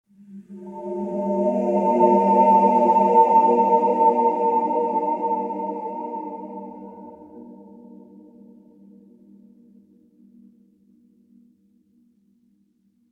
Field BGM; Xenoblade Chronicles 3

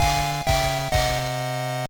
Jingle

